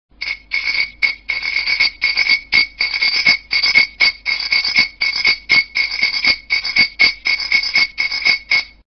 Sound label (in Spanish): botella; tradiberia